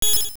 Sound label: Get Rupee; Get 5 rupees (same sound, except repeated 5 times very quickly); Zelda Oracle